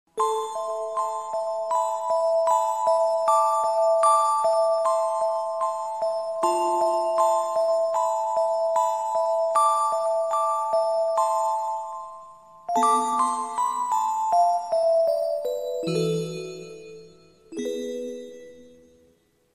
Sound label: sad story ringtone